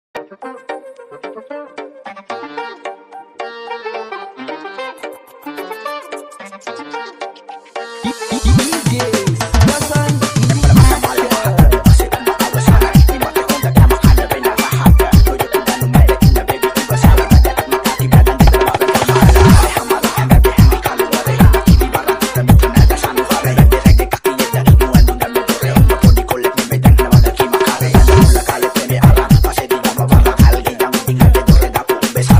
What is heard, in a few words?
dj remix